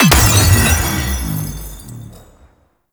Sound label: Tear Enemy Explosion; Warframe; Cephalon Weave